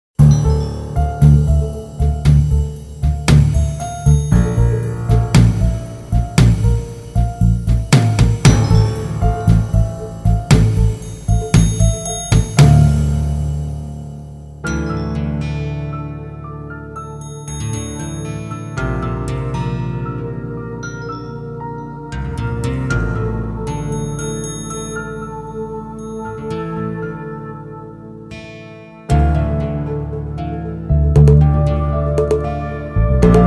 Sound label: Instrumental